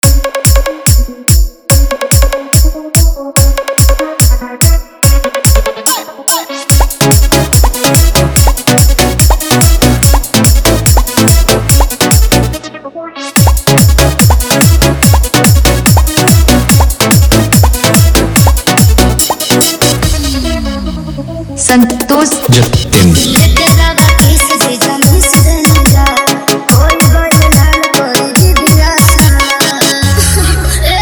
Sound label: Crack Bass Mix